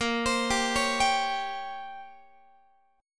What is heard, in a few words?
Bb Minor 6th Add 9th Bb down Guitar; Bbm6add9 arpeggiated